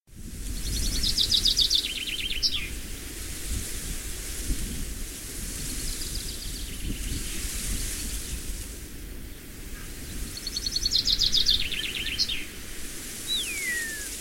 ambiance

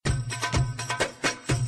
memo music arabe

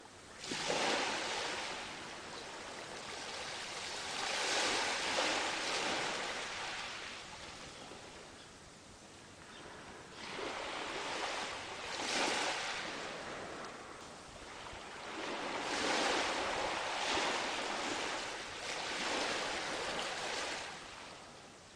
ambiance

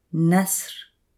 aigle

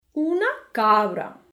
chevre

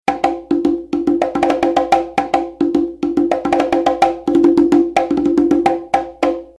conga court

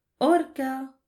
orque

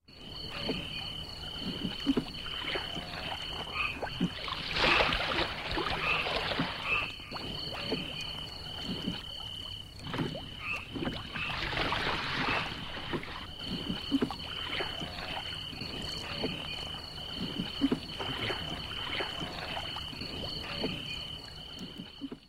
ambiance

